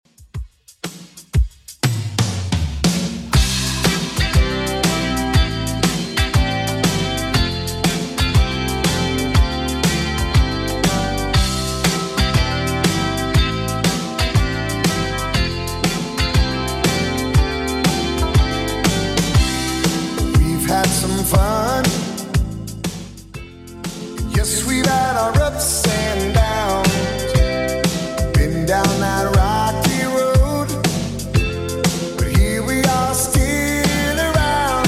80s Redrum 120bpm CLEAN

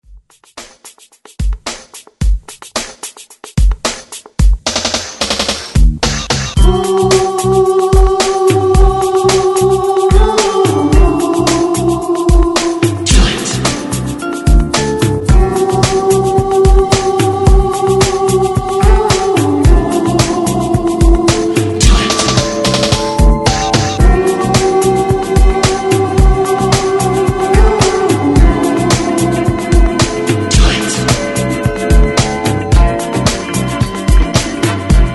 70s ReDrum 110 Bpm

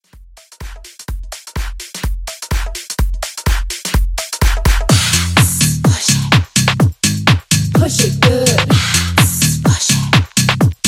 House Redrum; Remixfreakz

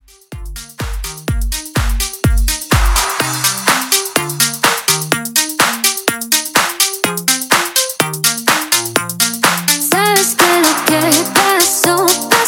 Latino Dance Urban 125 BPM

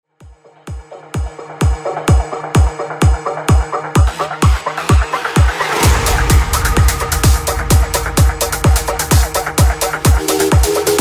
80s Big Room

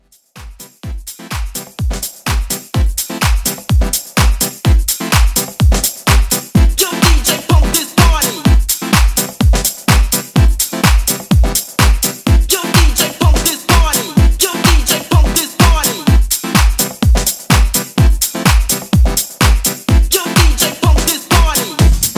Classic Hip House 126 Bpm

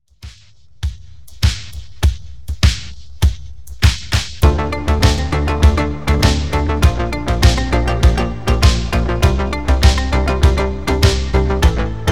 Re drum

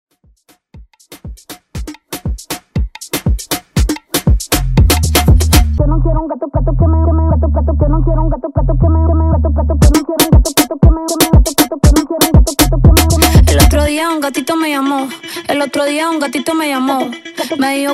Moombah Redrum

